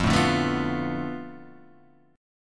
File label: B Minor 7th Flat 5th F, guitar chord